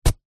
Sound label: metal shovel spade single dig grass soil